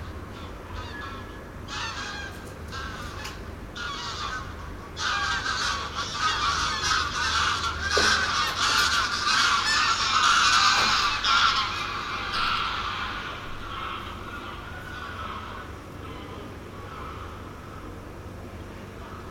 wild geese before night bavaria

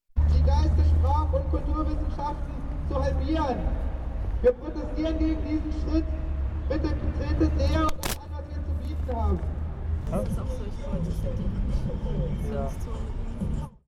demonstration at university only 4 people with us; gansemarkt hamburg

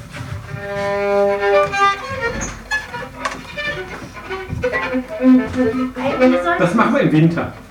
mad violinist; hamburg

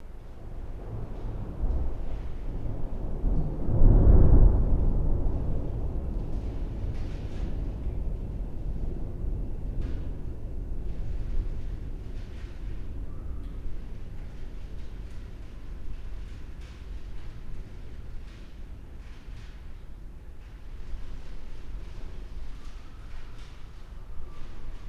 thunder rain hamburg

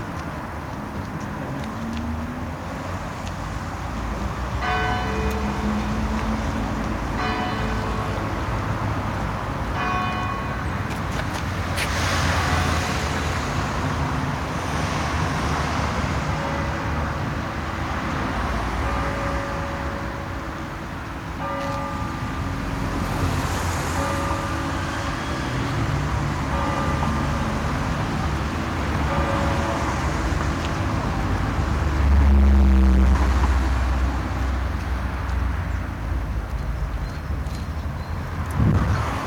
6pm noise church heidelberg

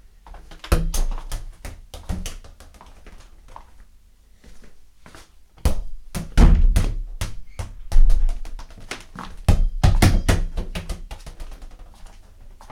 soccer inside house; schlagenhofen

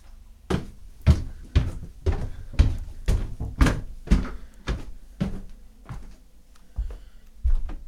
stairs very old house; schlagenhofen

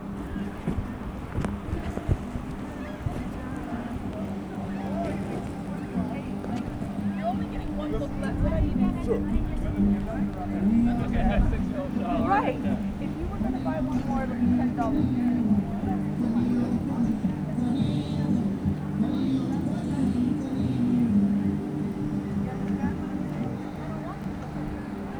book sale nathan phillips square toronto

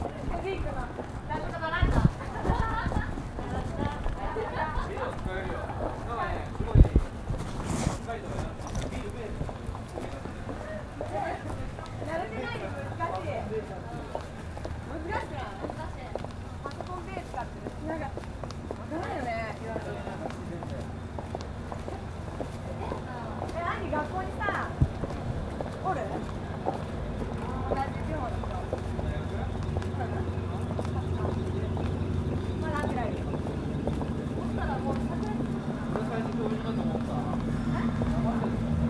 walking chinatown toronto

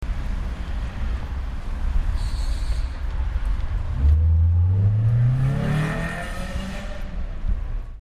sports car train station hamburg

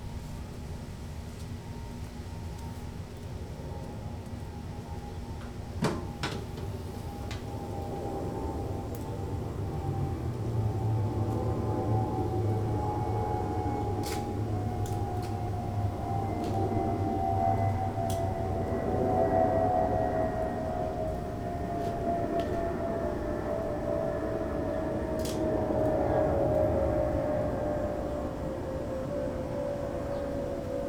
backyard noises jets bugs; Toronto

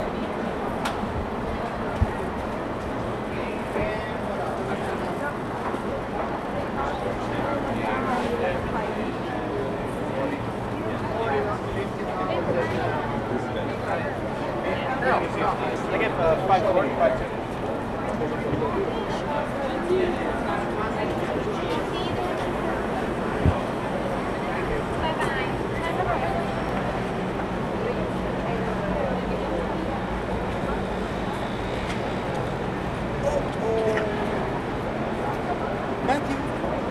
st lawrence market toronto

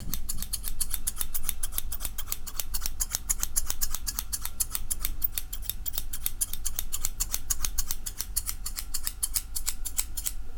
scissors like any room heidelberg

